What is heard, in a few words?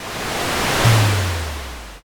fire magic